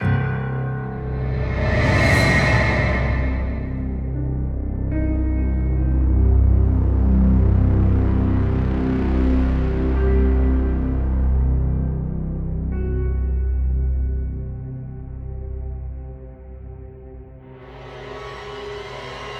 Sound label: Retro; Crime Secrets; windows